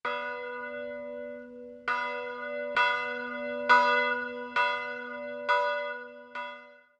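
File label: Bell H1, electronic bells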